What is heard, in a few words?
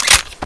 xm8 mag out, weapons